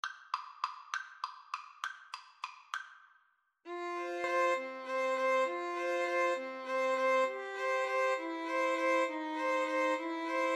waltz, Violin Trio

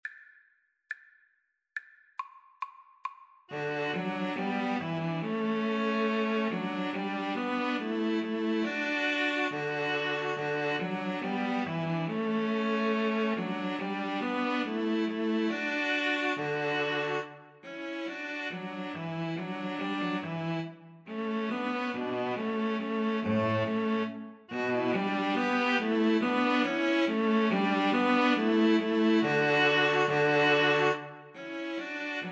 ding dong merrily, String trio

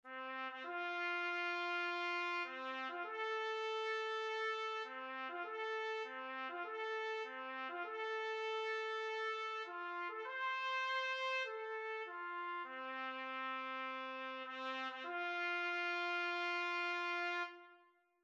taps, trumpet

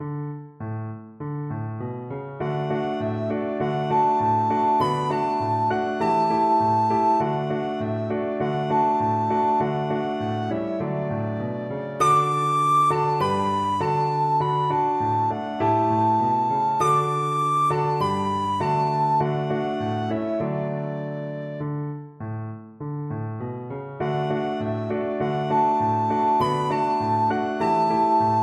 recorder

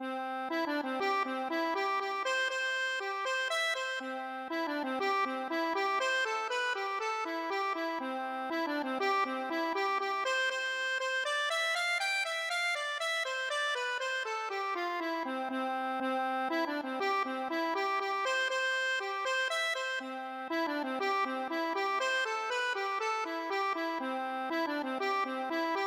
accordion

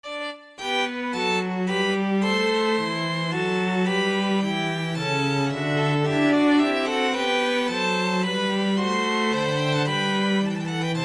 eine kleine menuetto, string quartet

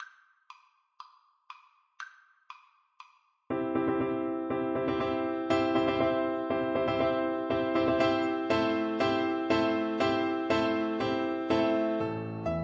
horn, French Horn

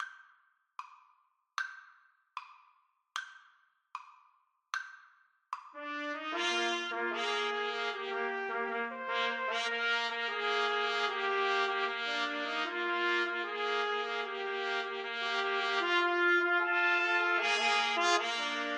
Trumpet Trio

